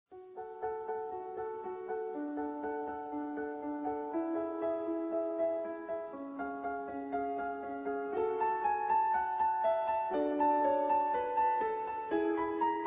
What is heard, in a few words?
improvisation